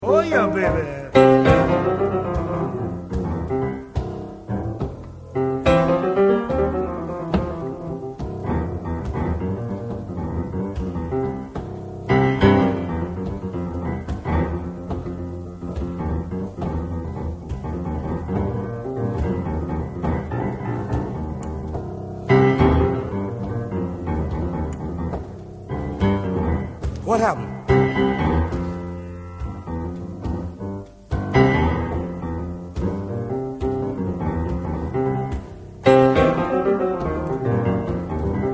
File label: Blues